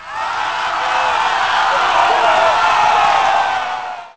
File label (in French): abordage; Pirates Of The Caribbean